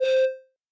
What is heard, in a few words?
organ C4